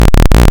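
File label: waveform FM sawtooth sine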